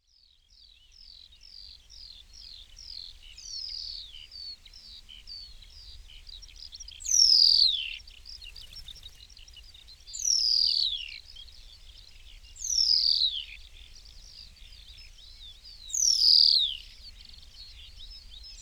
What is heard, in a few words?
New Zealand pipit